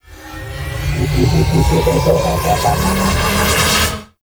Ship Pulse Riser; Warframe; Quests; Wraith Quest

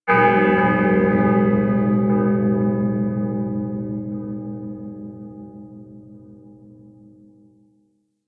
amphitheatre bell; Ambience; Props; Warframe; Duviri

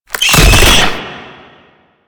Weakpoint Appear; Warframe; Nineteeen Ninety Nine; Infested Survival

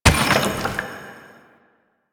Excavation Gas Pickup; Warframe; Nineteeen Ninety Nine; PvPvE

